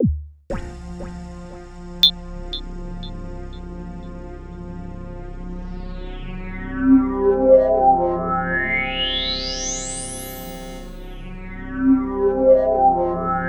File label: C3, SynthLine